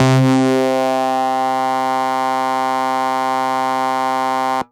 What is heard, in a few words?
syn, Techno; single-sounds; single-synth; Techno ID